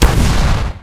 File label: impact airblast; video games; source engine; tf